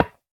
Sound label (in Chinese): 骨块 破坏声